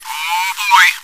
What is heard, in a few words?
run